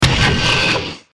大型ロボットの駆動音

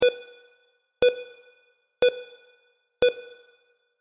制限時間

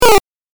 8bit 失敗

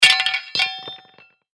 金属棒落とす

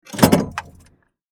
公衆電話 受話器 置く

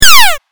8bit ショット

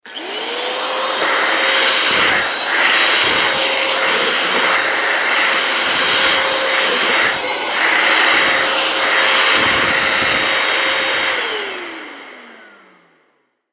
掃除機で床掃除

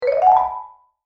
マリンバグリッサンド

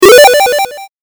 8bit 回復

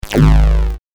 電源オン

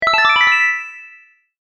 完了

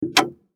公衆電話ボタン

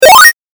8bit かわす

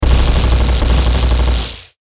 マシンガン 長

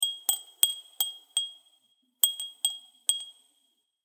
ガラス風鈴

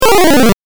8bit 下降

